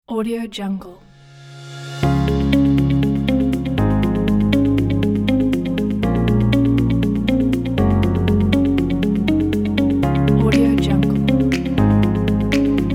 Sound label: inspiring motivational commercial corporate